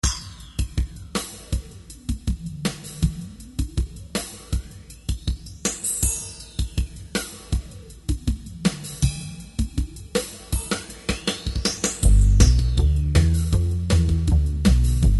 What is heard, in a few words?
fender jam